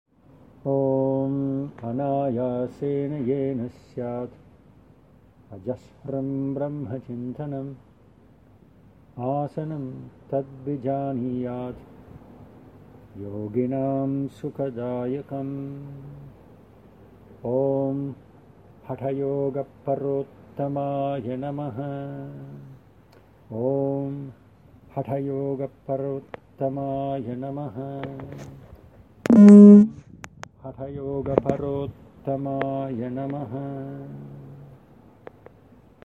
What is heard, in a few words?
Swamiji Chanting; Anāyasena yenasyāt